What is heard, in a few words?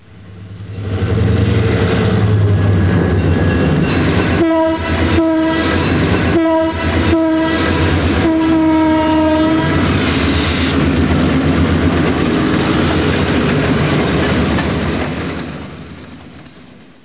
Diesel; amtk 416